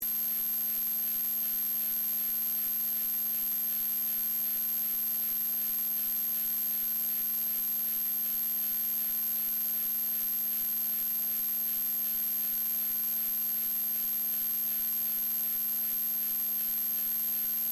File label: Radio Static; Scifi, Radio, Filter, Sweep, Communications, Science, Fiction; BlastWave